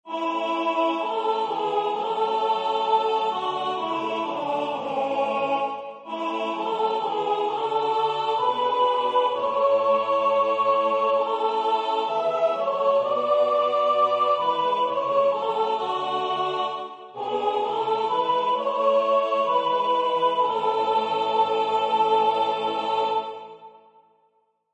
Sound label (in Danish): sopran; Min Jesus, lad mit hjerte få